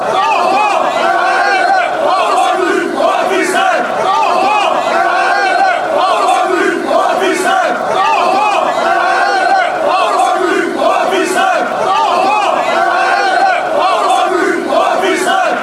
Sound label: chant, fanchants